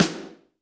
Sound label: snare, Zoom MRT-3B